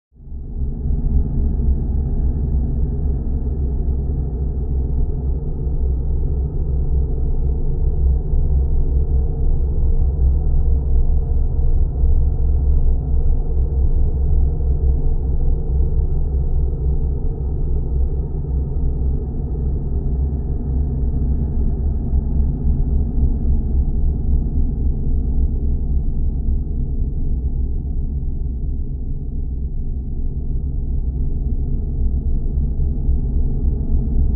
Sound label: Growl Ambiance, horror